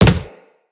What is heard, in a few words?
Walk Wood, Footsteps; Operator